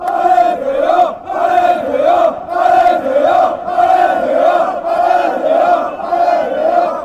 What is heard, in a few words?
come on, chants